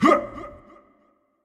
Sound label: Effort Short; Marv